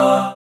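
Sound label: synth chorus; Best Service Gigapack 1; SYN 04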